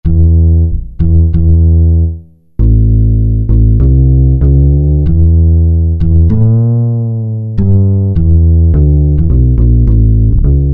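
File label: bass Wood, Yamaha TG500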